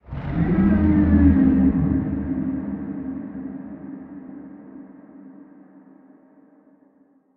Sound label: amb unknown creatures kaiju; Sfx amb unknowncreatures kaiju1